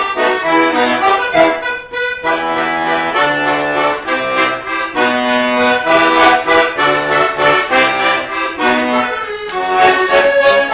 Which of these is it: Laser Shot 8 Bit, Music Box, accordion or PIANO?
accordion